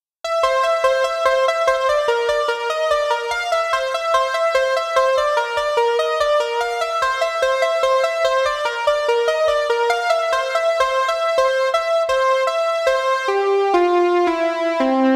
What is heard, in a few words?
String